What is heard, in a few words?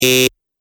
Electronic Buzzer Medium; ring